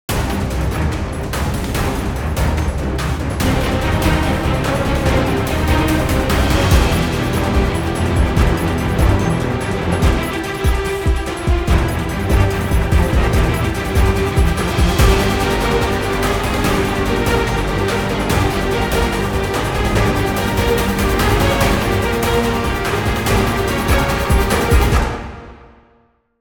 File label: epic string drum fury; filipe leitao